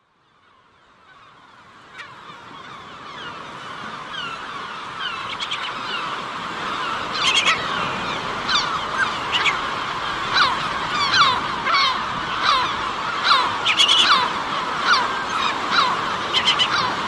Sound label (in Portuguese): Gaivota patas amarelas Berlengas